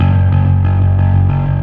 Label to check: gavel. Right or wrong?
Wrong